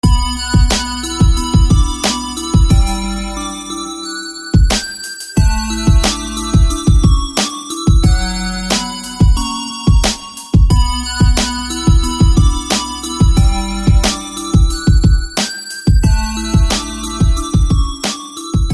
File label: High Chime; SFB FX